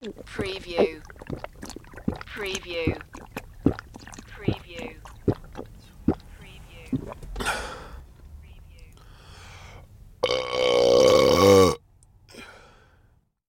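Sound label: Drink Burp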